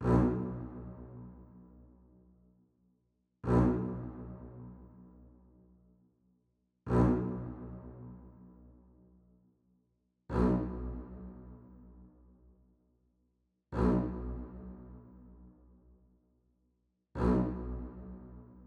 140 BPM B Min Stab; Melody; Loop Stems; Cymatics; 2022 Melody Collection; Drill; Sacrifice

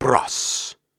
Grunts and Chants, Viking Vocal

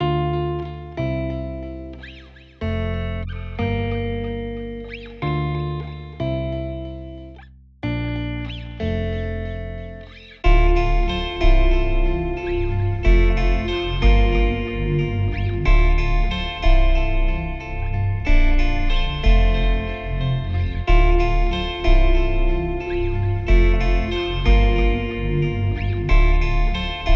92 BPM F Maj, Guitar Loops; Trauma; Cymatics; Journey Guitar Loops

